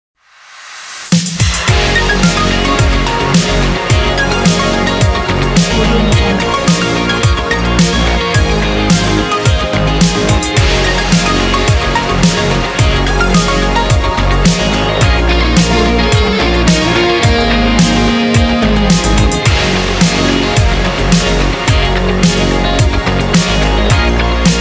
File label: more inspiring days; audiodarts